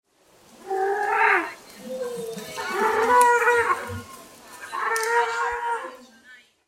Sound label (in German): Rosapelikan Pelecanus onocrotalus